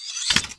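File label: crossbow pull; The Elder Scrolls III - Morrowind; Morrowind GOTY GOG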